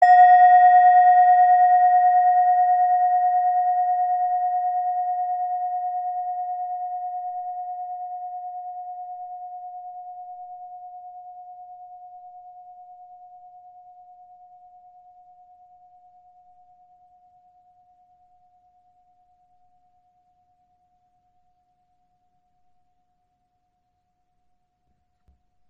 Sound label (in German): klangschale titan